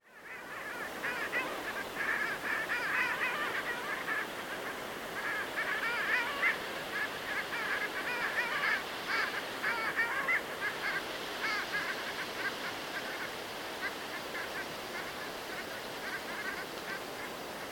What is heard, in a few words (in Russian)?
moryanka clangula hyemalis; utok